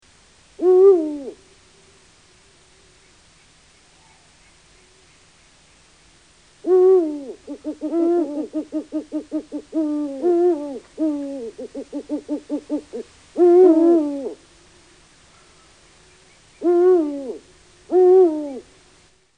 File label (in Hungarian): Uhu; Madarak